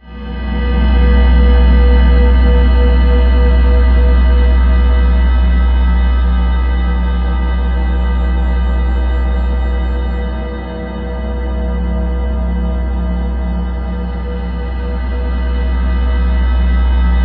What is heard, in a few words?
SPACE, Ominous Drones, SYNTH SND; USB Soundscan vol.48; AKAI